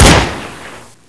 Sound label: glock18; weapons; c-strike